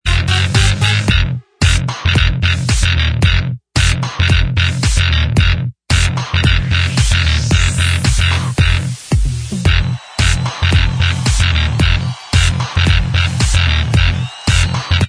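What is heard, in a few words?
instrumental, backing track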